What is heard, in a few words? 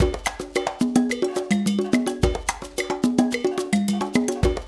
loop, Percussion Slam; InVision Interactive